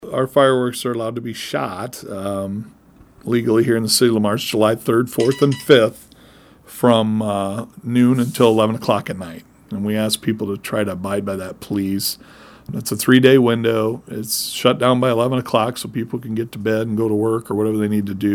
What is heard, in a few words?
Fireworks